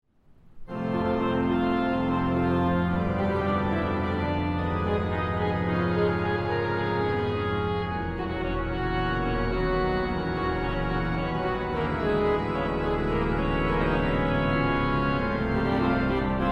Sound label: Fanfare; Cook